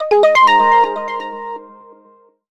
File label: Touch alert; Sony Ericsson; PlusFourSix; SE Sound Project; Lars Edvall